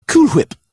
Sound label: Cool Whip